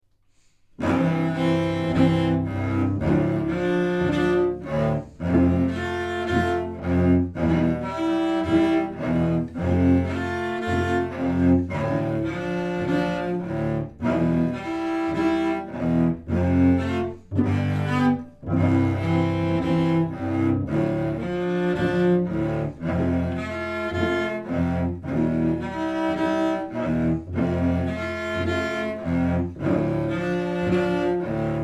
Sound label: Etude for 2 Cellos